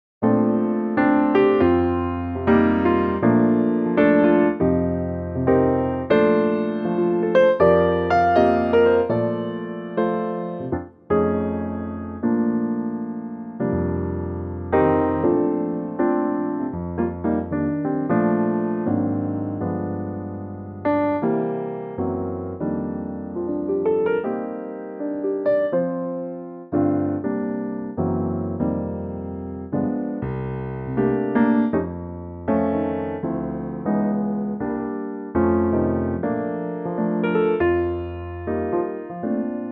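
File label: piano, backing tracks; key - Bb - vocal range - F to Bb; Superb piano only arrangement, at a great tempo, of this hugely popular old standard. Piano and vocals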